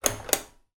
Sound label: heater bathroom switch off; household